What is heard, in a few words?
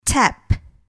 tap